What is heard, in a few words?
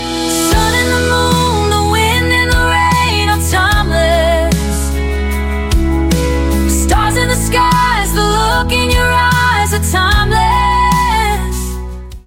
Timeless Ringtone